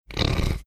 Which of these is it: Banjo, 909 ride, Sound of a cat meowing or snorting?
snorting